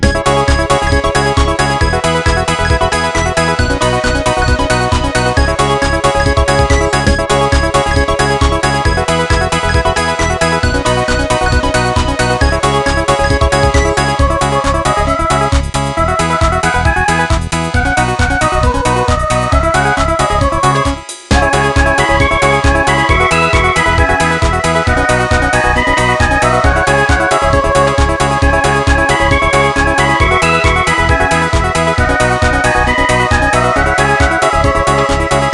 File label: butterfly ringtone alt